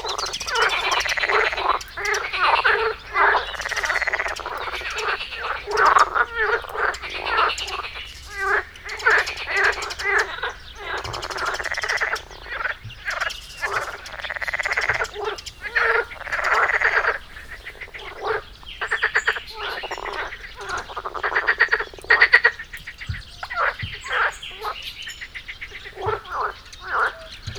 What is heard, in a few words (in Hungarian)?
elcsendesedő békák halastavak; hortobágyi halastavak